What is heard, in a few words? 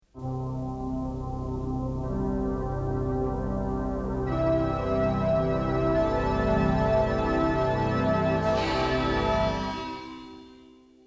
(Instrumental Version)